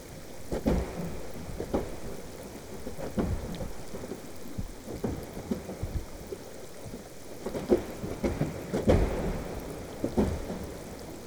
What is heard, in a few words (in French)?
Hydrophone Gros Effondrement